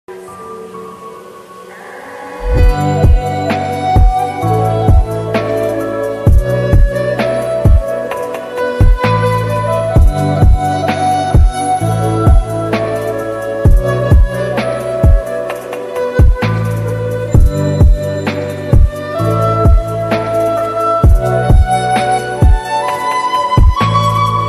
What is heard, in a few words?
lofi ringtone